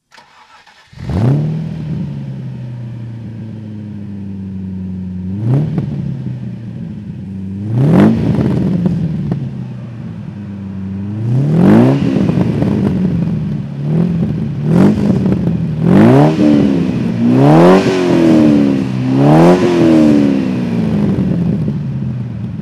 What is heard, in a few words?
morgan+8 throttle; Morgan +8 3.9 Litre 'wide body', 1997; Engine sound; V3D/ ClassicarImages